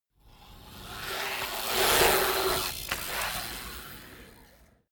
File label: bmx maneuver inside bowl